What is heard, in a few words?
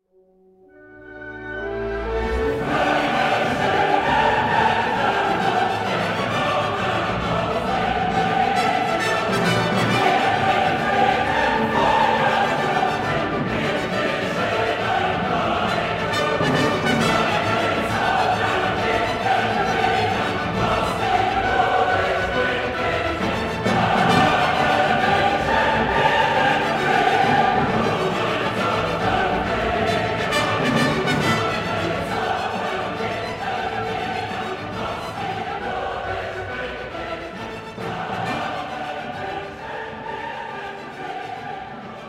symphony choral; Beethoven; Daniel Barenboim; Ode to Joy; Symphony No 9 in D minor; Op 125